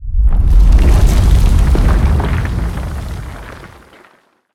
Earthquake

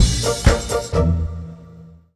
Exiting Vehicle; The Simpsons Hit & Run; ps2; Kang and Kodos Strike Back

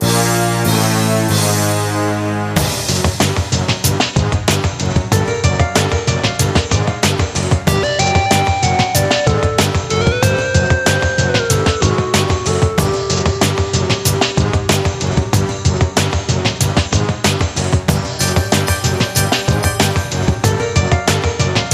Music event

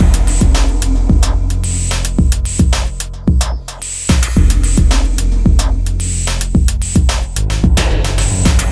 Alert; Aurora Watching Gorky Zero; Gorky3 Lev7

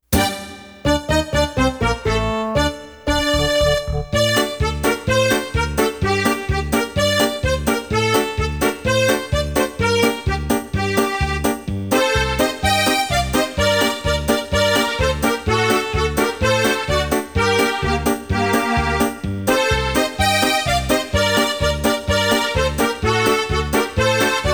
Korobuchaka (Russian Folk Song) ~Folk Dance~; Tokimeki Memorial Forever With You

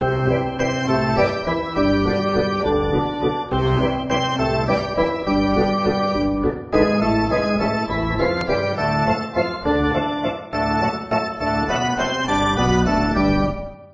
Ditty; All Star Baseball 97 Featuring Frank Thomas; PS1; Saturn

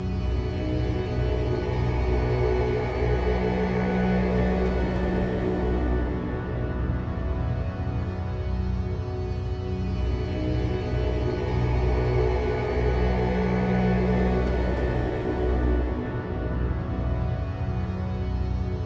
Boss Loop; Summer Catchers

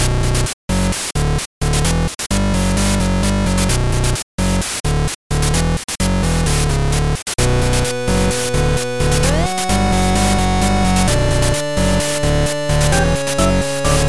Main 8bit Menu Theme; Caveheart Legacy of the Depths

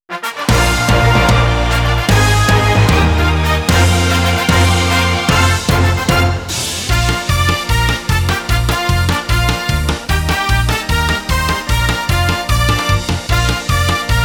Hitting March; Family Stadium 2020

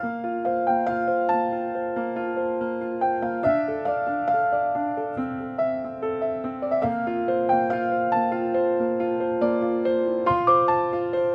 Music Level Inspiration

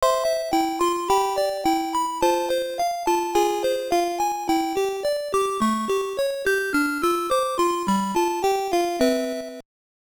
Jingle; WonderSwan

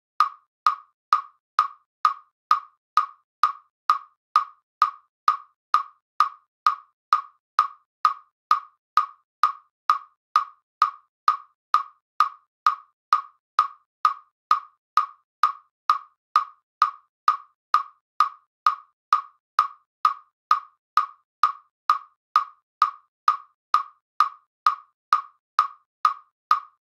Forest song - Metronome; Underhero

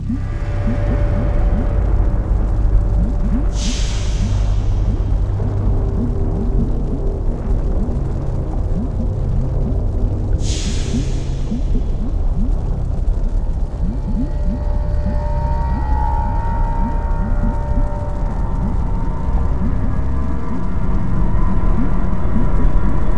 Level Lava Cave; Dungeon Hunter; android game music

